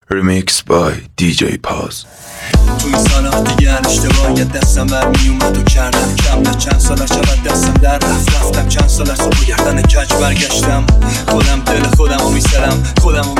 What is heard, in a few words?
Remix tribal